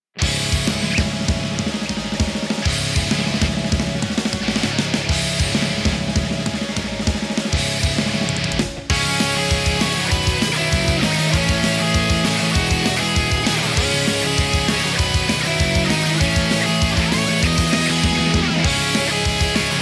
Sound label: G,B,Dr